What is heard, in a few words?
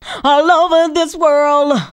Female Hooks; Sound & Vision - Gigapack II CD 1